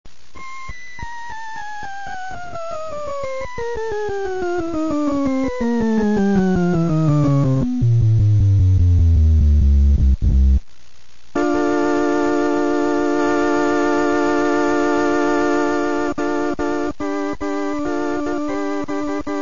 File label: Gem Organ